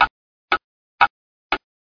Clock

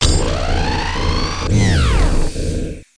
ship arms close

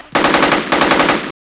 gun

